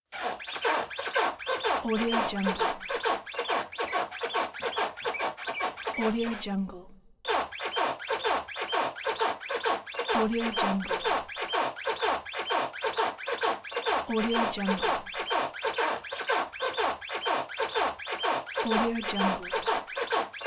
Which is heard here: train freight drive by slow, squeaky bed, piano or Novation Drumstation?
squeaky bed